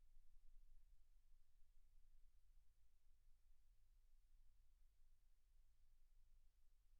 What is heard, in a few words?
silence 48kHz